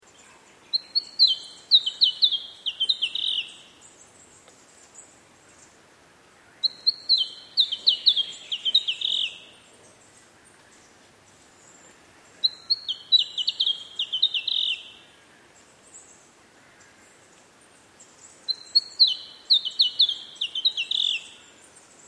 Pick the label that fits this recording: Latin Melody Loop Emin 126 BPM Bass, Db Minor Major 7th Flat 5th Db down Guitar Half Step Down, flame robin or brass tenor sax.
flame robin